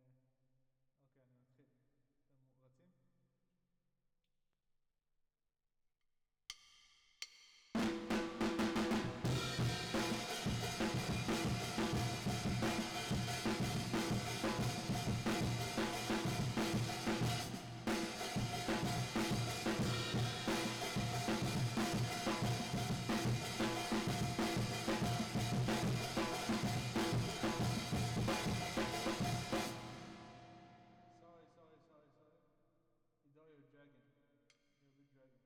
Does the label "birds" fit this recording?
No